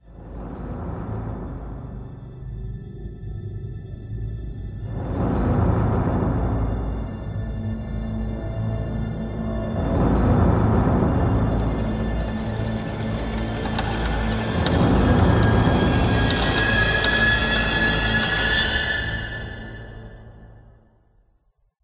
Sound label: Horror BUILD UP; unrest indigo